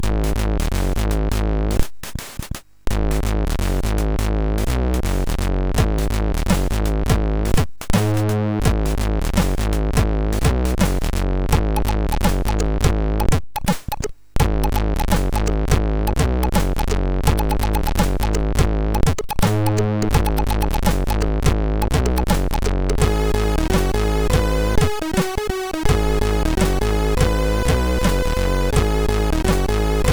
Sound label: Funky Track, MOS6581R3 sid